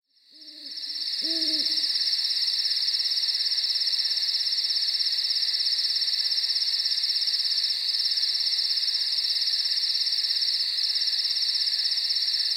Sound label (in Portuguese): base de funk